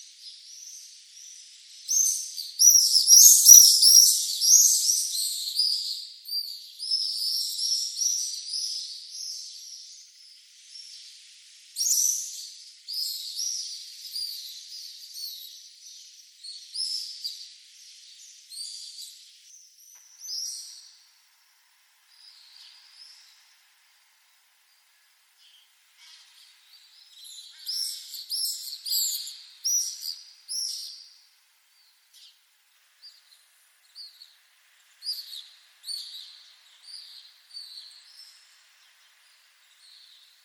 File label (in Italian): Rondone Pallido